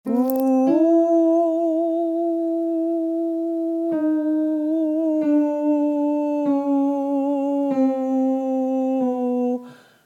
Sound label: tenor